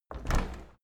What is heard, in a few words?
window handle open; objekte; fenster